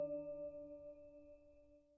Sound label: sonar Tail Air Close Directional; Barotrauma; Real Sonar